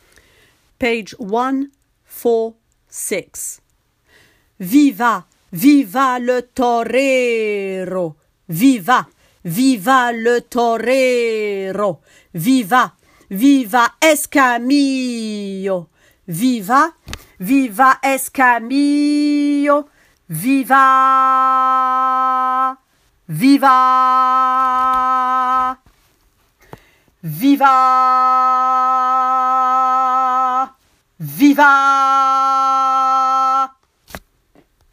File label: bas; Carmen